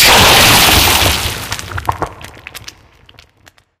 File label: object dissolve in goo; Source Engine; Portal 2